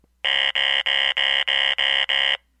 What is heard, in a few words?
bedwetting alarm sound; night guard